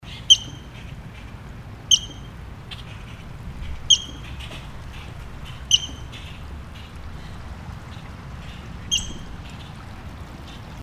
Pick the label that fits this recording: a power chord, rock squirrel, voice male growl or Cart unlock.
rock squirrel